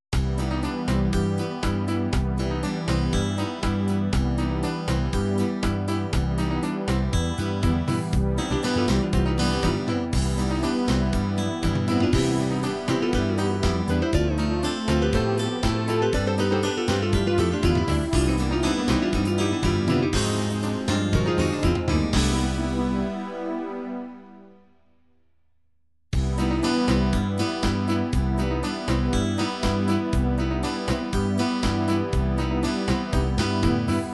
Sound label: polka, midi